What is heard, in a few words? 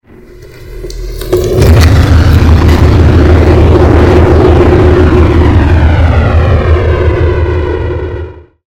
skateboard